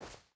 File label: footstep barefoot snow run; Rust